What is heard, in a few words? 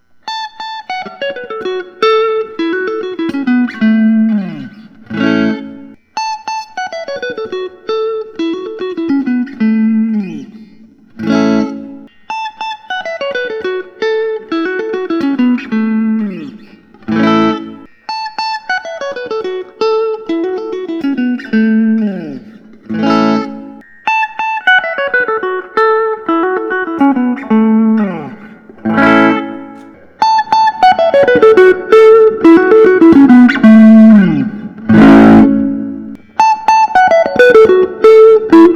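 james tyler studio elite caramel cappuccino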